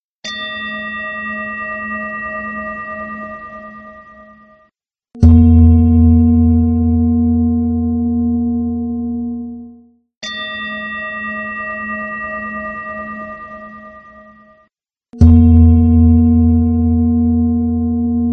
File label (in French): Cloche coherence cardiaque grave aigu